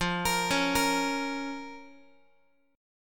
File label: F Suspended 4th Sharp 5th F, Guitar; Fsus4#5; arpeggiated; x,13,13,11,14,x